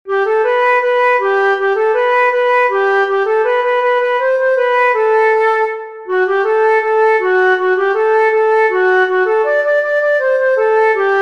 Polly Wolly Doodle, flute solos

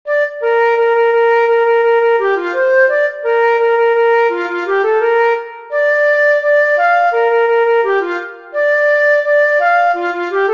Pick A Bale O Cotton, flute solos

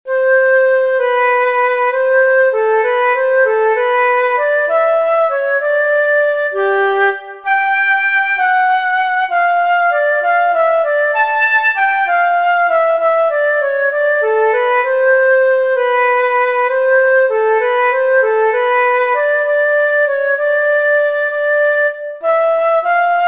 Sleeping Beauty Waltz, flute solos

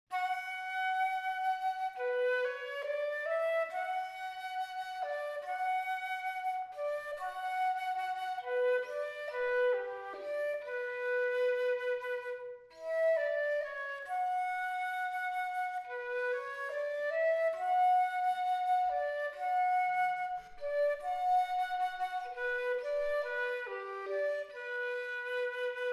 Flute and Piano; tchaikovsky; Swan Lake Theme

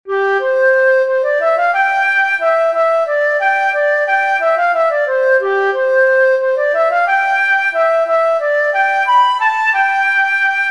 The Huntsman's Chorus, flute solos

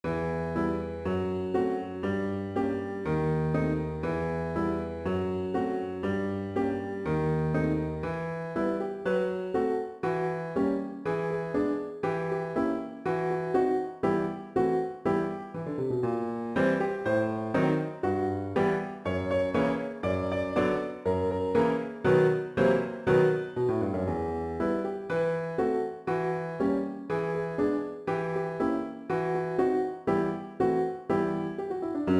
Flute & Piano backing; tchaikovsky; Dance of the Sugar Plum Fairies

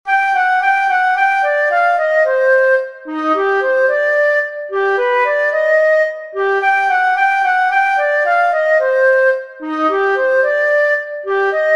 Fur Elise Key Of C minor, flute

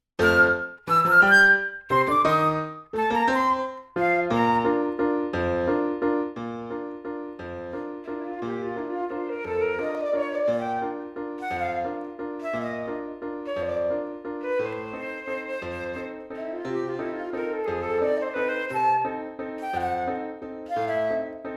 Flute and Piano; strauss; Voices of Spring

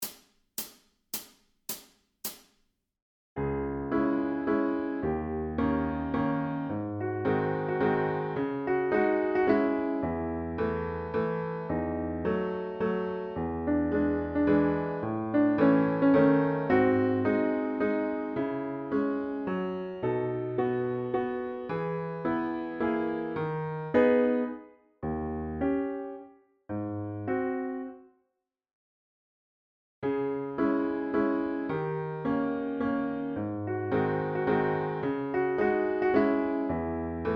Waltz In C Sharp Minor, flute; backing; chopin; Op 64 No 2

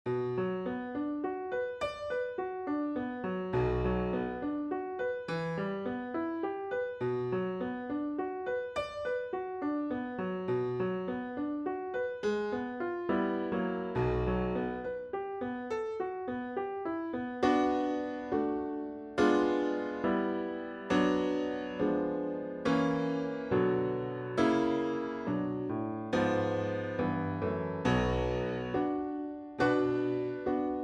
Flute and Piano backing; tchaikovsky; Swan Lake Theme

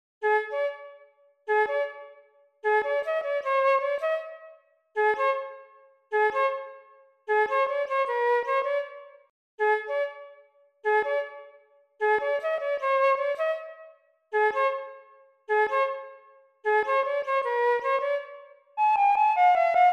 The Mexican Hat Dance, flute solos

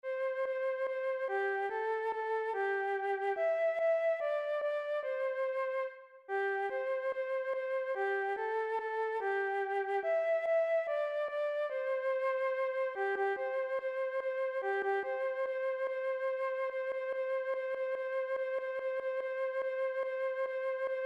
Old MacDonald Had A Farm, flute solos

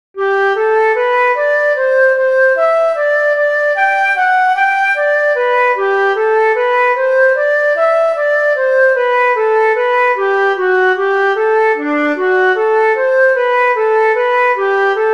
flute; Jesu Joy of Man's Desiring

